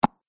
Teeth Single Bite; Human Sound Effects; Mouth Sound Effects

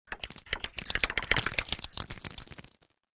Weird Random Sounds, cartoon; Miscellaneous Cartoon Sound Effects

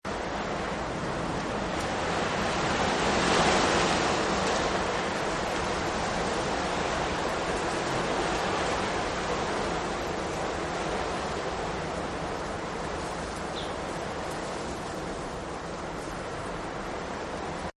Windy Outside; Weather; Partners In Rhyme

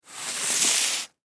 Rope Slide, Foley Rope Slide On Ground; Rodeos; Blastwave

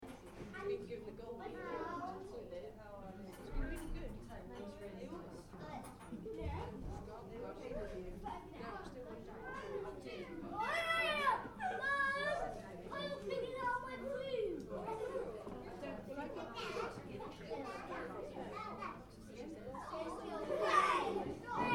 Party, Children, Small: Lunch Ambience; Cafeteria Sound Effects; Cafeterias (Sound Effects:Backgrounds); Interior kids party lunch; Stuart Duffield

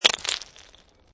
Snow And Ice Bits Of Ice Falling On Ice

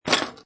Pinball Sound Ball Rattling Objects; Video Games; Lynne Music

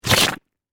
Gurgle Mud, Texture, Gurgle, Mud; Water (Sound Effects:Nature)

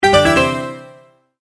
multimedia tone alert

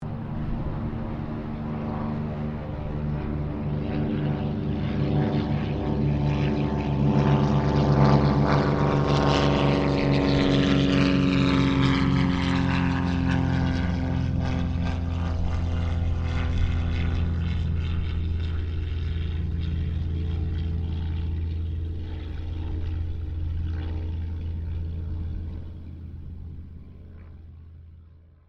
AIRCRAFT CT4 WINJEEL WIRRAWAY HARVARD PASS; Mixed aircraft squadrons; Airplanes

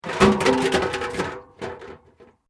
Metal Light Movement Minor; Materials

